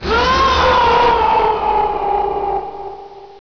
man screaming; ambience; rebar

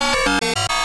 think, ambience

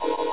forcefield; ambience

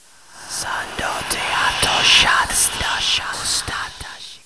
ra chant

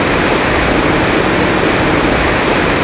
big waterfall

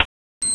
click

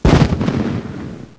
battle boom; ambience

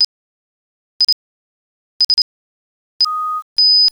tang timer; timers

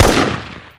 sentry fire; buildable

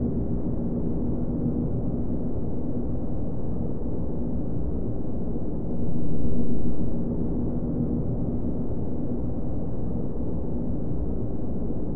ambience room

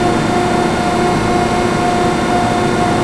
machinery

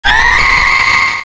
scream

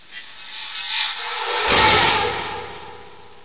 alien scream distant; ambience

